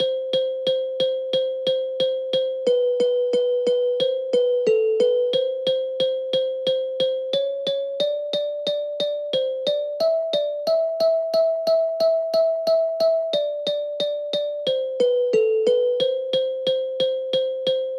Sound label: Base Bell